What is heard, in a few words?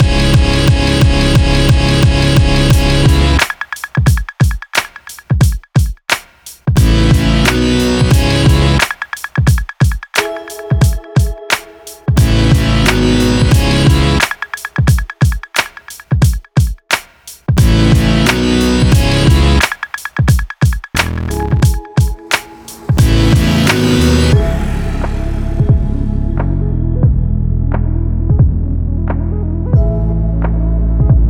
Accordion Flex